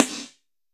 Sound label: SN, Snare; AKAI S6000 CD-ROM - Volume 4